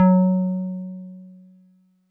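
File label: Wood Piano DX100 F#6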